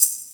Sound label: Percussion; Vengeance Samples; VEE Percussion Instruments